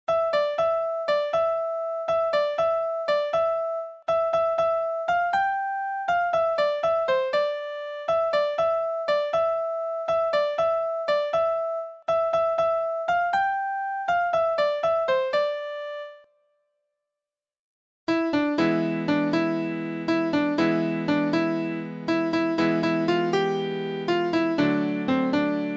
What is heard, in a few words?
Piano